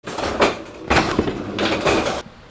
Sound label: INDUSTRY